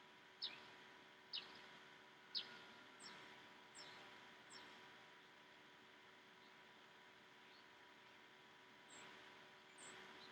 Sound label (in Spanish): casa pajaros